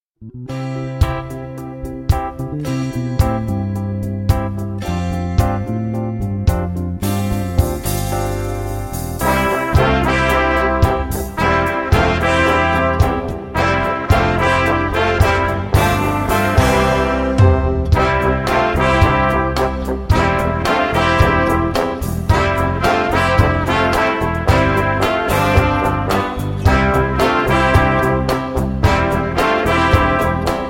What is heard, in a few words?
Brass